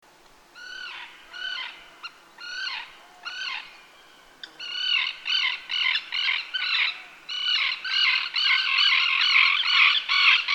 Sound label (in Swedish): Fisktärna